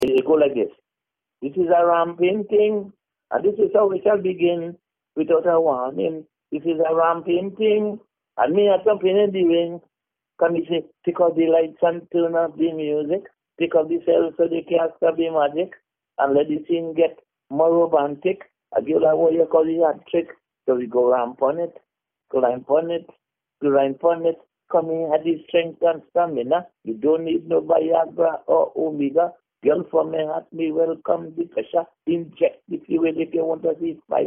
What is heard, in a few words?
acap